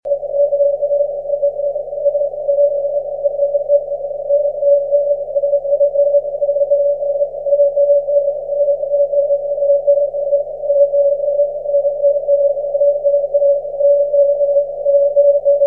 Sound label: 6cm